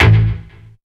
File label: hardcore, BASS, Techno Trance; Techno Trance Essentials